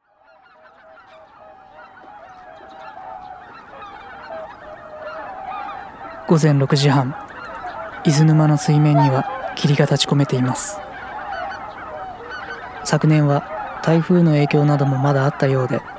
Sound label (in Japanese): 伊豆沼の渡り鳥 ナレーション入り